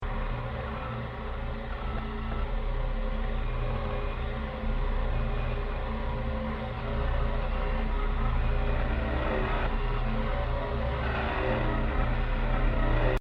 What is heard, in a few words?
wide ambient tone